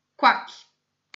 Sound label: quack